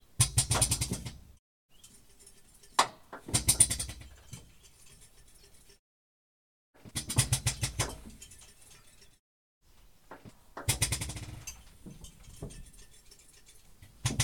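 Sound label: fokker dr1 onboard spin prop front under engine; Fokker Dr. 1 1917; PZM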